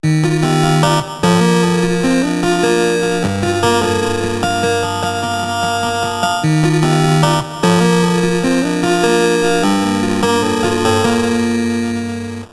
bit crusher medium; warmth dev

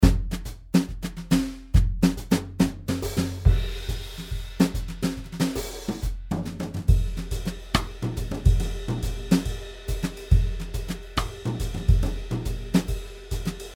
loop kit extra 140bpm, drums; kensal clubdate drums; majetone

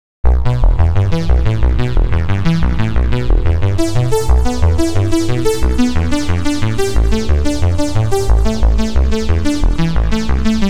vanadium arp; the space soundset

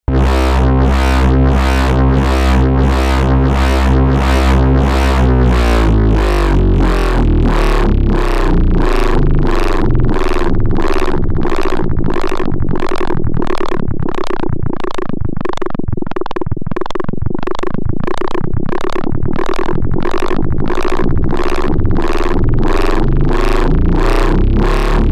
growl bass preset; fernando tanzo

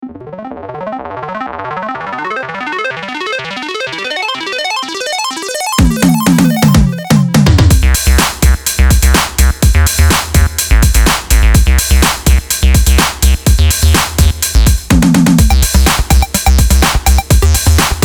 sequencer one; soundtrax

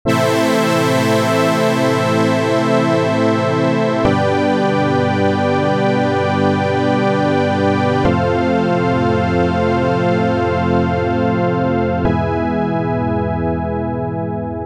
sustained filter sweep; foxfire; chiral audio

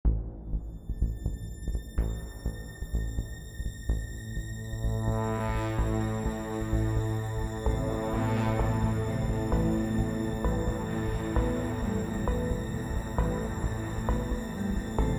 vital cinematic ambient; horizon; golden screw studio

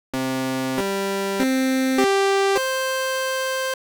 Arturia J8 test; TAL

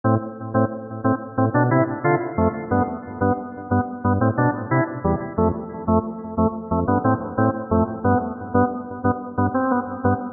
lead; fire by music sound software

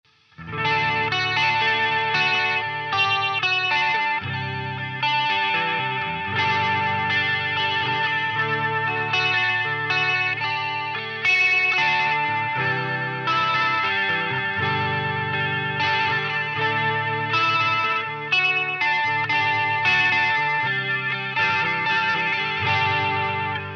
tremolo; audyo engineering

